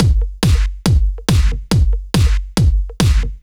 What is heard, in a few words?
Kick Loop, 140bpm; Zero-G Trance Inducer; Drum Loops 140bpm; 90 s Sample CDs